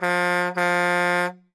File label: Truck Horn